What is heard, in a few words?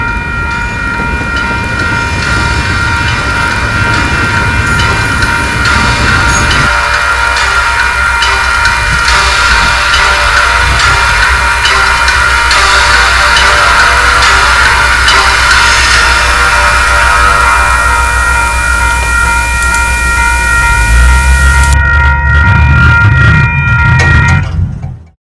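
loop of short railway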